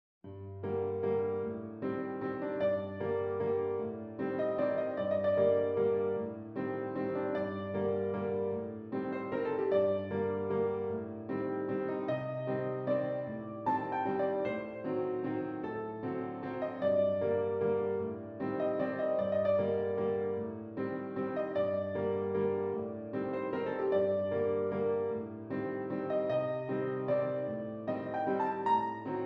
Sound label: Waltz in G Minor